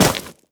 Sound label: step run, footsteps player debris